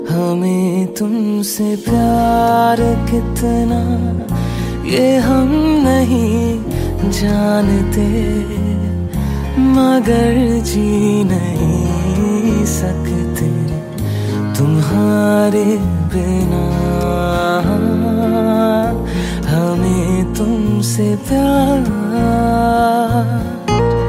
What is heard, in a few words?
Old Hindi Ringtone